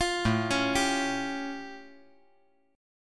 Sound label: A Augmented 7th A; 10,9,9,10